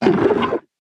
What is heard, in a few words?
stomper death, enemies; Factorio